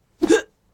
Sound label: Melee Attack With Voice